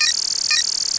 rotate, sentry gun

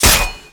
critical blade; Warcraft 3

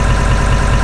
Kamaz

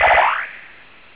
alert, sentry gun; CSSB

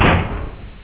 turret up, sentry gun; CSSB

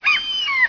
gull

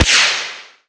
shrapnel fire; Warcraft 3

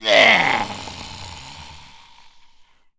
death, zombie

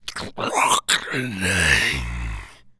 zombie carrier death

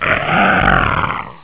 zombie die

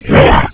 zombie pain

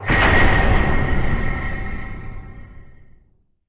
loot; Warcraft 3

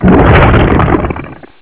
entangling roots target; Warcraft 3

